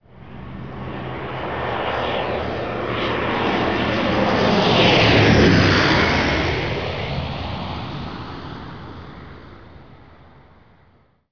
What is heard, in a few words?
jet over